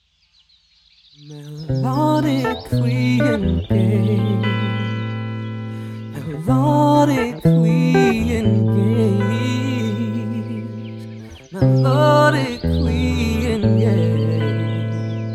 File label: melodically engaged interlude